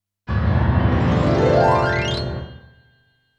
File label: PIANO, RNR for piano